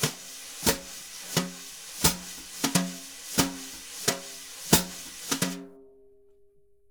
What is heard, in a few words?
BRUSH, Jazzistic; Univers Sons